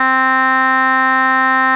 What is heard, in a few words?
voice